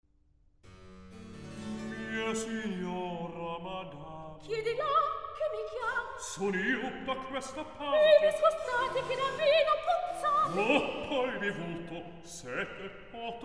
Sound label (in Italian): Recitativo; Mia signora Madama; Mozart; La Finta Semplice